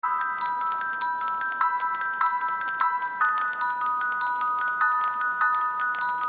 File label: Dinner Bells